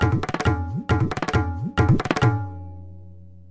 136 bpm, jugalbandhi tabla beat

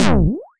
lofi Sega sample; Lofi Sega Samples

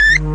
hophop squeal; Weird and Interesting Hits; single hits

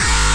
obnoxious kick; Kicks; single hits

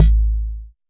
Jomox MBrane, drum machines; Jomox MBrane 11

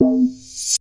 Processed Ethn Perc, ETHNIC and WORLD PERCUSSION

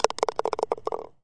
ping pong in cup; Weird and Interesting Hits; single hits

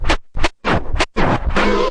turntable oh; Scratching and Turntablism; DRUM LOOPS and BREAKS

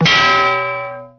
vintage cartoon manhole; Cartoon Sound Effects Kit

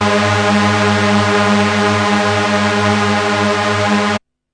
bass G sharp 2; multisampled; must destroy you bass 1

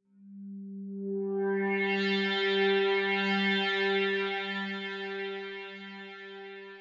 Metal Swell G3, Dark Swelling Synth

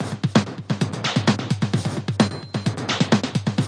130 lowland busy beat, drum loops and breaks

